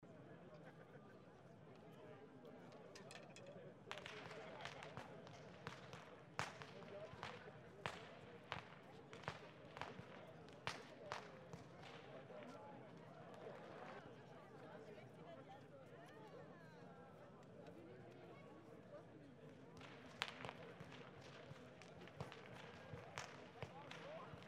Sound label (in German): schnalzen, jugend; waging